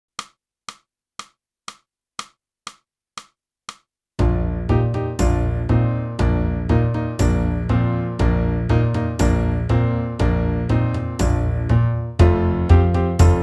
clarinet; boosey method

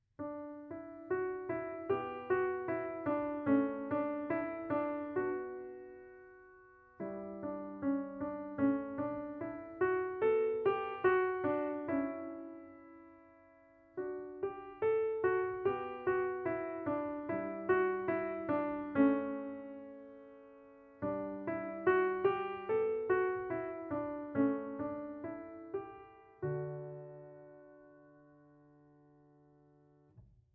piano

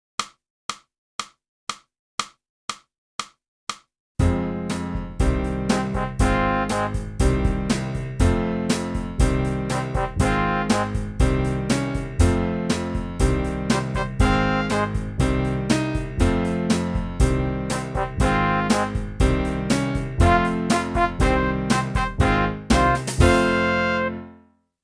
sax

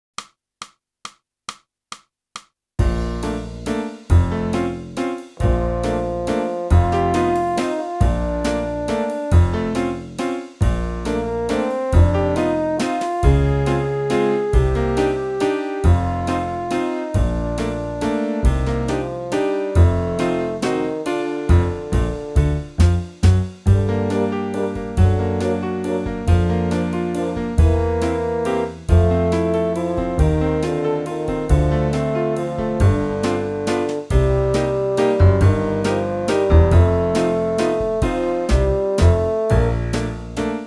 clarinet; boosey method

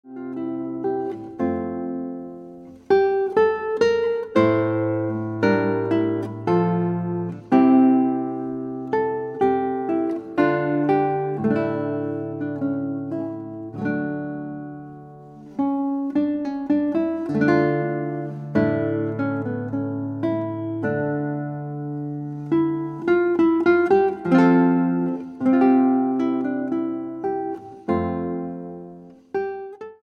Guitar

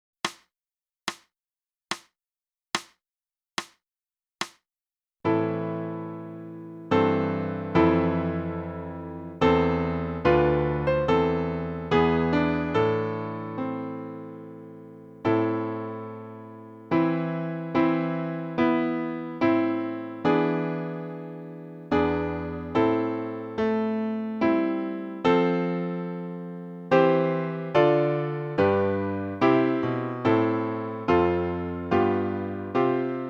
flute

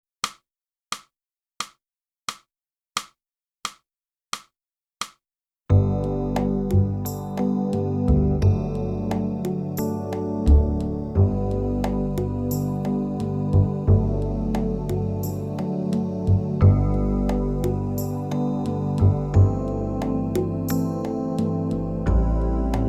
flute; Boosey Woodwind Method: Flute (Book 1)

